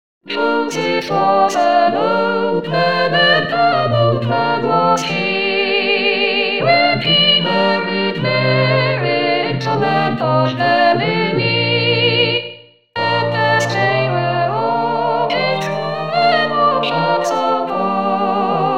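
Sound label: cherry tree Soprano 1; Cherry Tree Carol; xmas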